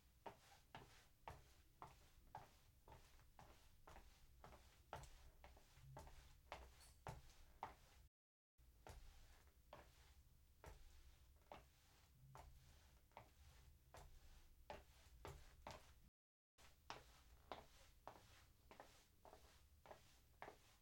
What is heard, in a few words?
Fast Walk Slow Walk Normal Walk And Foot Movements